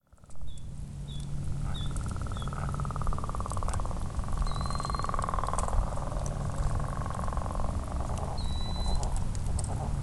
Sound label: bullfrogs heard on a run